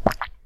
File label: drink